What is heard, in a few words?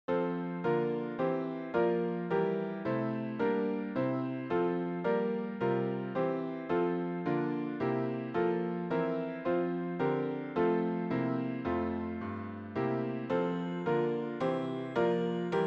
Handbells w Organ; Lord of Glory; Hyfrodol